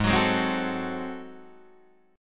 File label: Ab 7th Flat 9th Guitar Open D, chord